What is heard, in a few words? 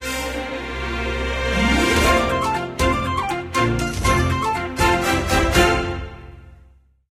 Stinger Launcher